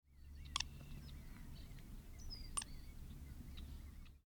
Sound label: American Coot, bird songs